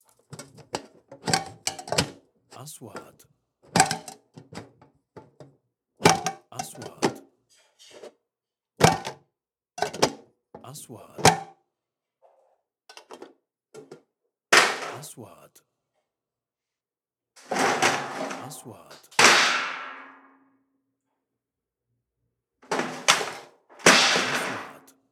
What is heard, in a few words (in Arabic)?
صوت خبيط ادوات المطبخ; sound effect; kitchen